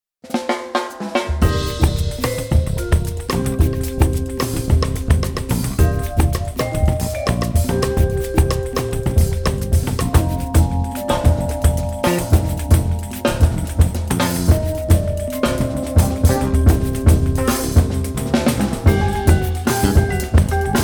pandeiro

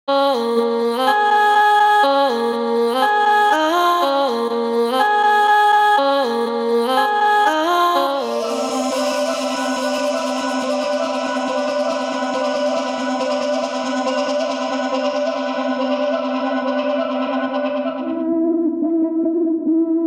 slices

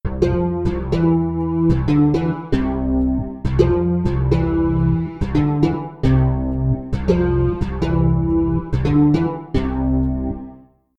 bass

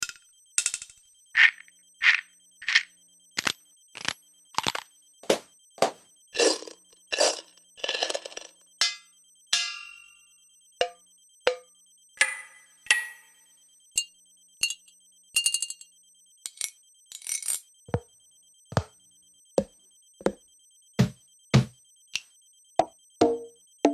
percussion sfx; mogami

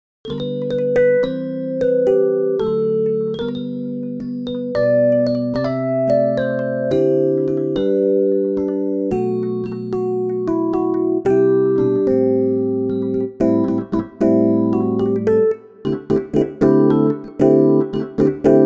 phaser preamp eq

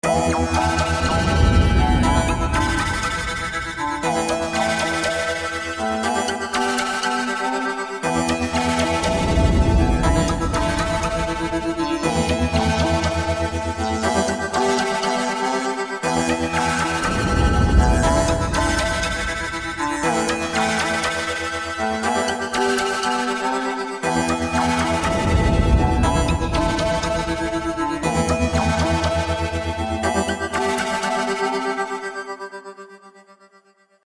movie music

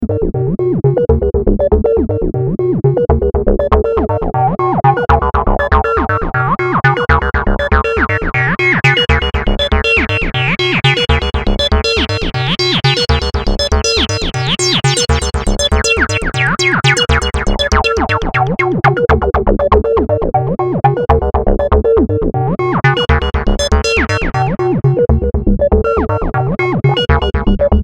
trance

